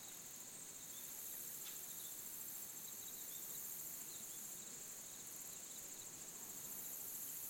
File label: jungle Day Ambience; wild turkey